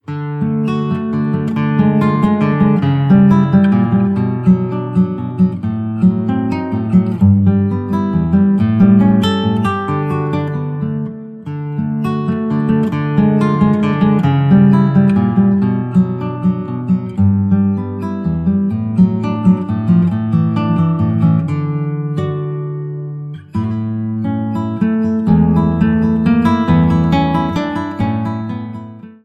laendler; joseph kaspar mertz

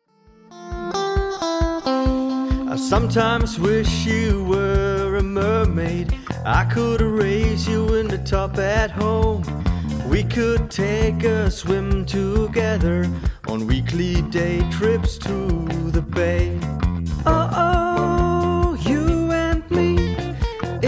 you and me in my pocket ohne ukulele

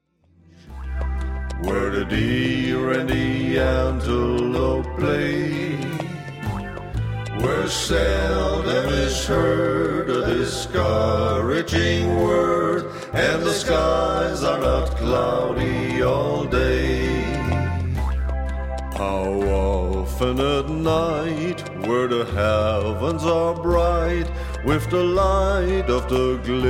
ohne ukulele

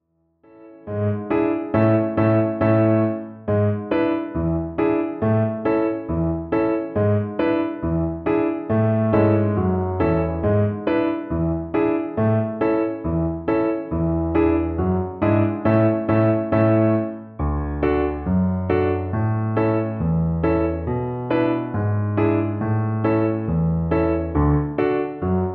schleifer polka

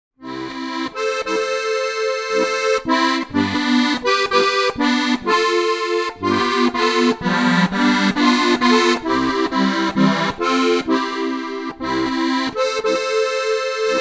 bauernmenuett

